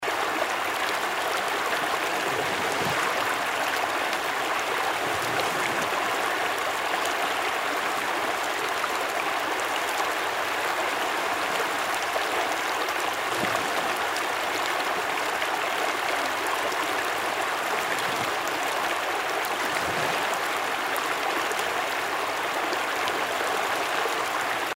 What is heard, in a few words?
ambient river; game rip; mabinogi